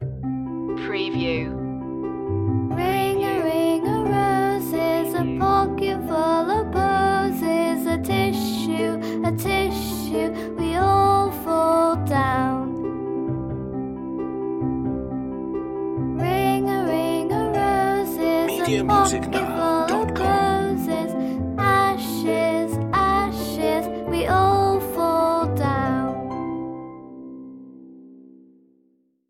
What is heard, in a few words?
ring around the rosie children vocals